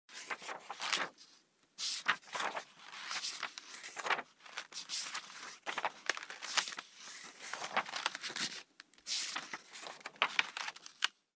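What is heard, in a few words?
quickly skimming text in a book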